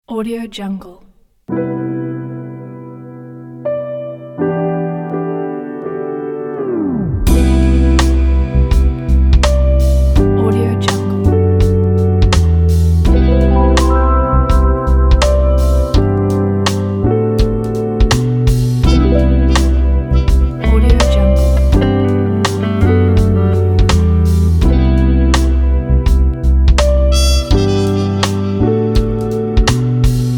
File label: lofi lounge good vibes